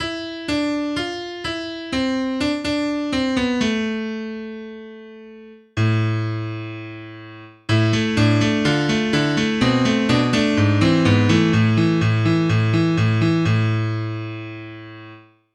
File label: bright yamaha grand; SpectMorph Evaluation #4